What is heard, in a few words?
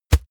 plastic tense bag heavy